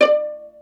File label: pizzi total, stringed; East West; Global Sounds